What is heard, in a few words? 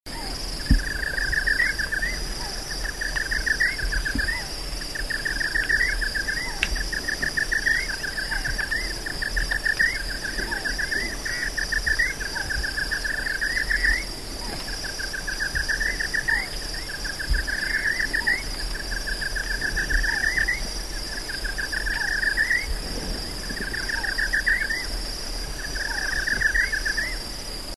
Least Nighthawk, S. America